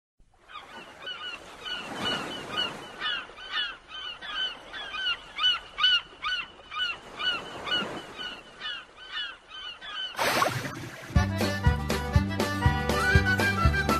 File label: intro instrumental; Bob esponja